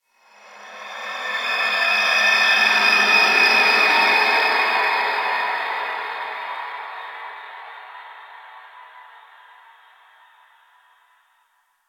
Space Exterior Dist Call, Space Battles, Ambience; Warframe

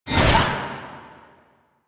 Whip Swipe; Weapons; Melee; Warframe; Dagath

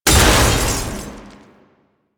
Loot Crate Open; Objects; Narmer; DE; Warframe; Storage Container

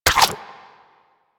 Inf Punch Life Steal E; Warframe; Weapons; Infested; Inf Kick Punch

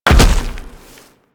Land Heavy Dirt, Footsteps; Warframe; Tenno

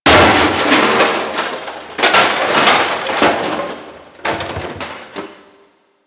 Shipyards Metal Debris; Warframe; Grineer Shipyards

